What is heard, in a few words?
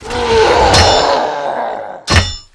die